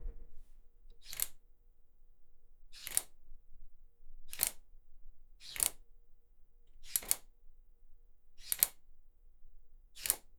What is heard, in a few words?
fast chair pulls, indoor